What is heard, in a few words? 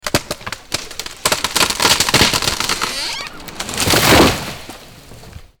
Tree Fall